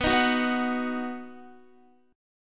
B Major, Guitar, 4E,4A,5D,5G,5C,6F; strummed; x,14,13,11,11,13

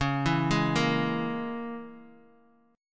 Db Minor 6th Db down Guitar Open D

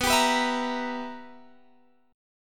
B Major 7th Suspended 4th Sharp 5th, chord

